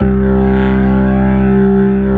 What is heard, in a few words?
DANCE ORGAN, Techno Trance